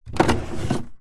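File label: dehumidifier movement slide; household; zapsplat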